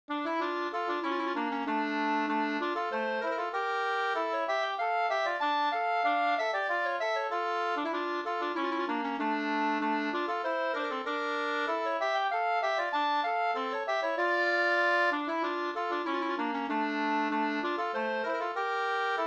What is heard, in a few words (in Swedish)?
polska Bingsjopolska; e Pekkos Helmer 2st